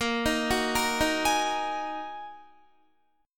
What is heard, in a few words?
Bb 7th Suspended 4th Sharp 5th Bb Guitar, chord; arpeggiated; 18,18,16,15,15,15; 4E,4A,5D,5G,5C,6F; Bb7sus4#5